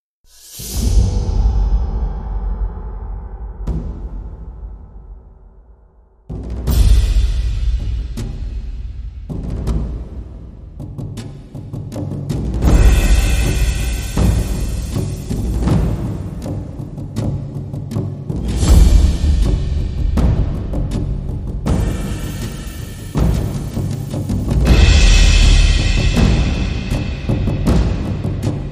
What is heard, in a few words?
Drums